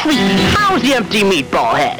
Slower version of the riff Sorta sad.; "How's the empty meatball head?"; Darien greeting Serena; Sailor Moon; Mamoru Chiba

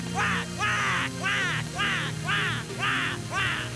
quacks; Mousse quacks; Shampoo

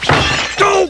crash doh

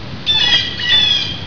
bluejay

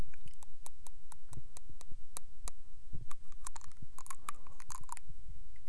click

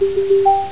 Door

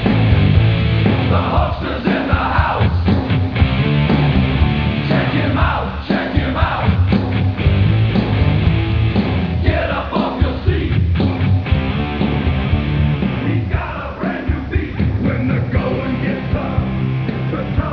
house

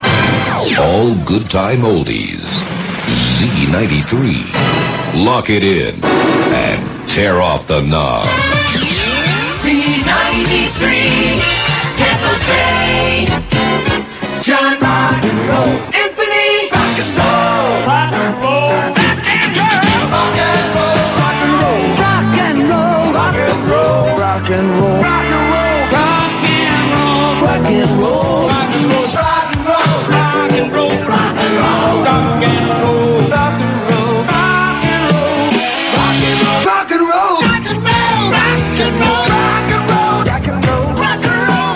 Jingle; WFLZ

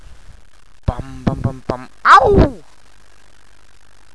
hah hah

